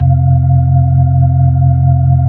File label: B-3, Keyboards of The 60's and 70's, B-3 Brite